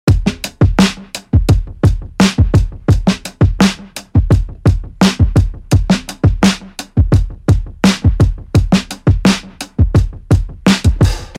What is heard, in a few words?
85 TAPE ECHO DRUM LOOP